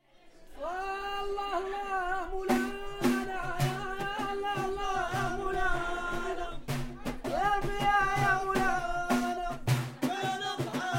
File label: Jilala Dhikr